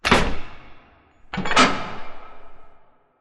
Robot Arm Pass Center Rotate Close; Boomtown; Titanfall 2

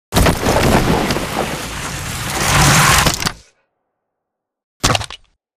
dish slide and gun; Titanfall 2

